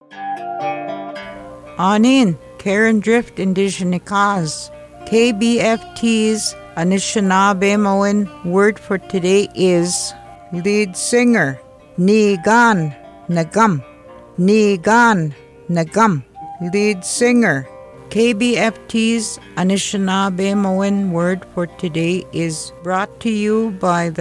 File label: lead singer